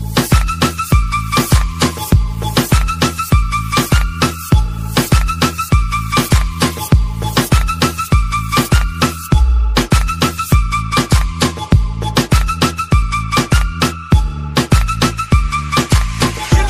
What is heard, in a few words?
english sound ringtone